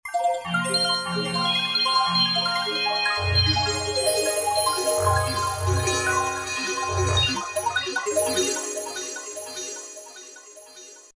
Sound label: Sea of Bells, Roland V-Synth; Factory Patches